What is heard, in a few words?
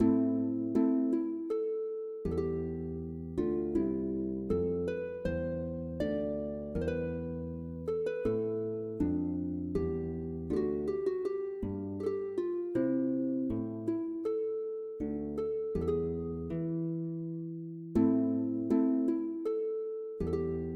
Sarabande pour Concert, MIDI